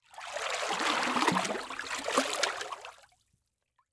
Liquid Water Water Hand Waves Single

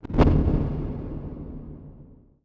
Multimedia Internet CD-Rom Flash Blast

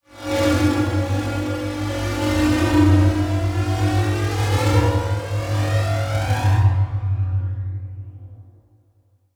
Title Transition Ascend; Adobe; Production Element

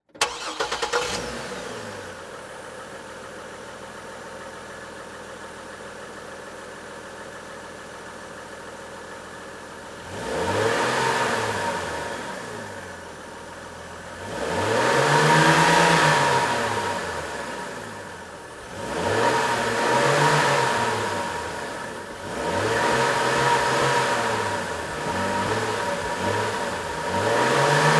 Chevy Trailblazer Start Rev Off Under Car; transportation

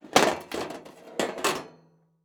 Sports Grill Metal Panel Sliding Out Of Grill

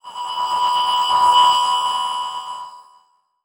Transition Stab Dark Metallic; Production Element

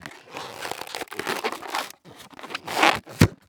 Cartoon Balloon Tie In Knot; AdobeCC

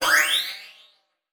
Multimedia Internet CD-Rom Flash Whoosh

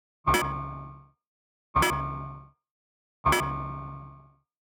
Error, ui; Kpow Sounds; UI SOUNDPACKS; GDC Game Audio Bundle

